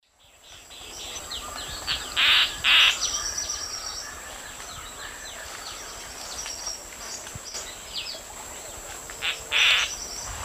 Synallaxis propinqua, calls; S. America; Eastern Lowlands

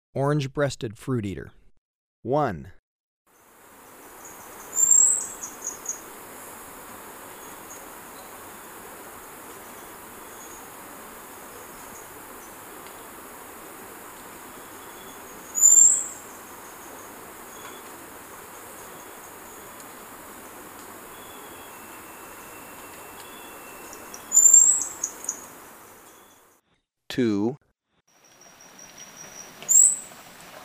Orange-breasted Fruiteater, Birds of Ecuador; S. America

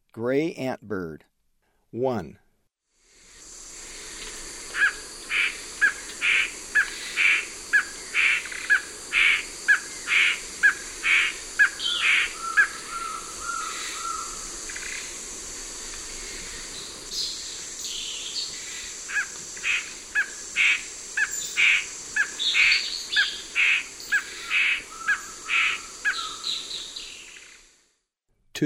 Gray Antbird; S. America; Eastern Lowlands

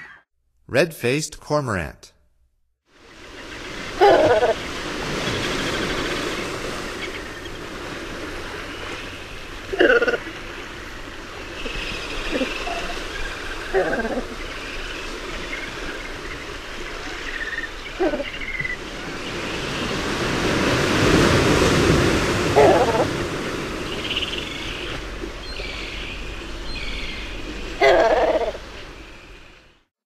Red-faced Cormorant; North America; Stokes

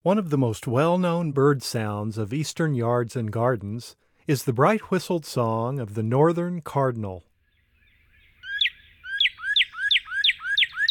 Northern Cardinal; North America; Common Western Species; Know Your Bird Sounds

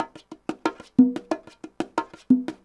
bongos 120e, latin, 120bpm

